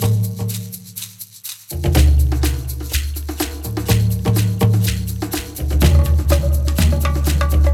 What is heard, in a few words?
TENSION LOOPABLE